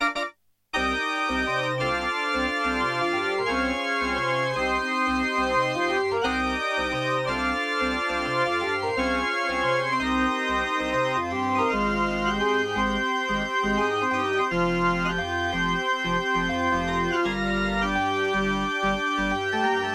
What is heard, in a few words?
KORG Lambda Organ